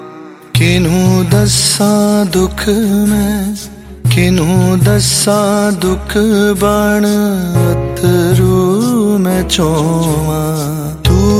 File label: Very Sad Ringtone